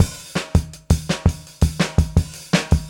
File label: RAW KIT, PROCESSED ACOUSTIC DRUM KIT; Sonic Foundry (Sony Creative Software); Vir Unis Infusion